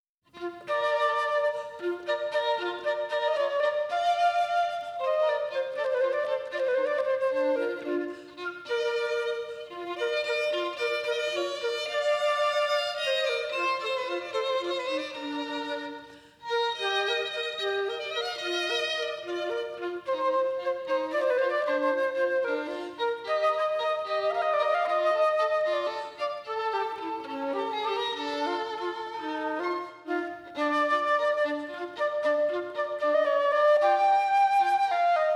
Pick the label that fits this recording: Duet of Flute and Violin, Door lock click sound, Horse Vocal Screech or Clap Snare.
Duet of Flute and Violin